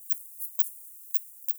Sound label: Pipistrellus pygmaeus; europe